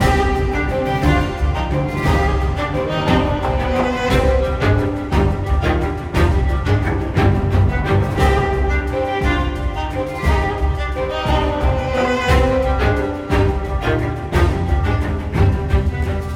String; Assassin's Creed Unity Dead Kings

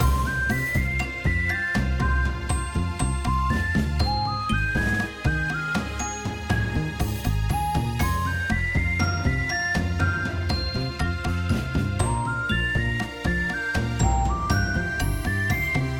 Lobby Music; Discord Activities; Letter League

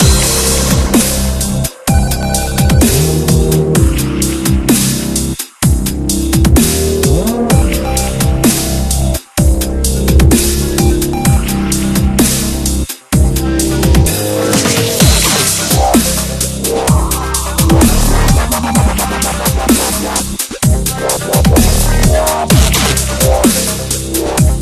Secret Base Background; Pixel Gun 3D

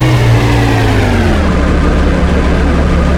hammer stop; blockade classic

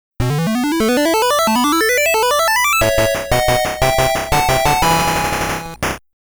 Stage Clear

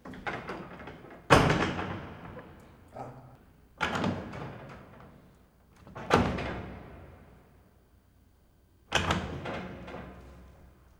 Door Latches Large Room; Lucius III

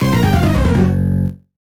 Excavation Failure; Spectrobes; Nintendo DS

